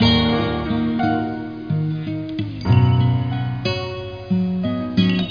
loop

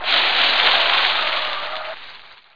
skid leaf; redline

